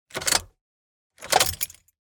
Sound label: Truck Keys Ignition; noise creations